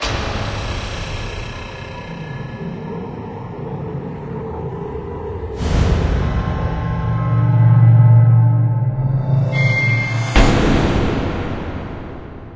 lobby backing; smite; chaac

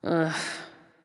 nv sigh; smite; awilix

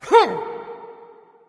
grunt; smite; guan yu

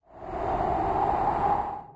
wind; smite; kukulkan

